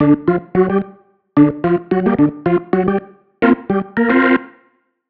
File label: Keys Line 110 D, 110bpm, hiphop; musicradar